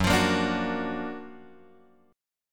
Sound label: F sharp Augmented Major 7th F sharp; F#+M7 Chord {2 X 3 3 3 2} strummed